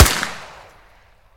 fmg fire, Guns

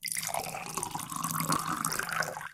fuel filling, vehicles

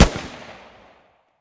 m4a1 fire, Guns

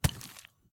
hit ground; Guns

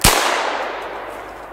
beretta fire, Guns

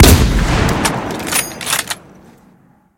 m82 fire; Guns

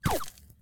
hit wood; Guns

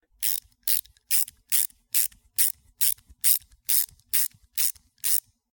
repair car; Vehicles

